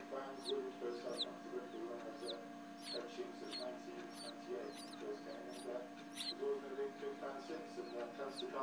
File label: chicks cheeping as they are hatching in the incubator